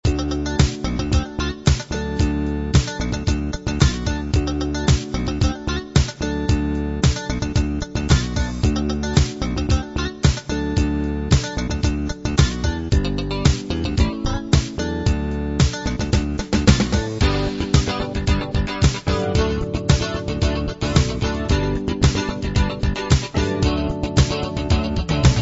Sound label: funk